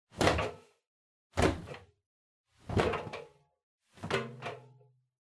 Washer Front Load Clothes Put In Strong

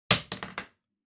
Lighter Drop

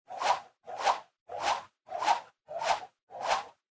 Motion Swish Low Set

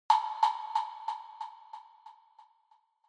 Woodblock Delay Low

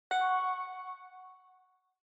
OS Sound (Speaker-Notification)

